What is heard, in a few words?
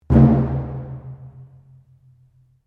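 Drum Taiko Odaiko mezzo forte, Large Japanese Taiko Drum; Miscellaneous Musical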